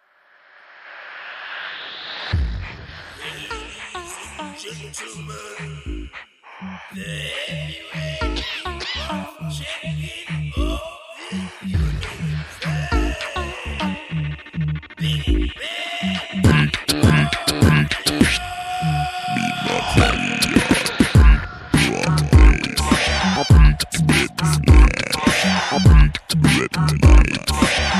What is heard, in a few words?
BeatBox